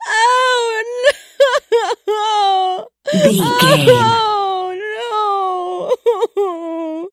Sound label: voice female cry